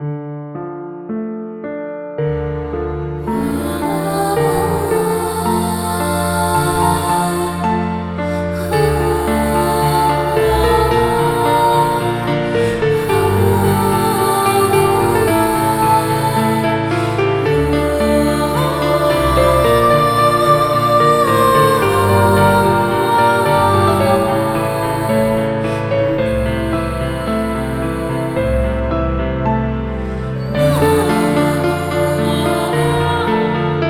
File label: fairy tale instrumental